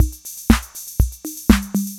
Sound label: INTROS FILL, DRUMS PERC YAMAHA